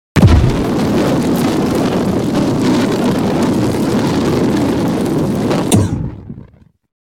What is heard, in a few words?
Cart Turbo; Google Be Internet Awesome World